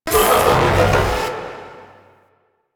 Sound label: Swarm UI Scanner Functional; Warframe; Entrati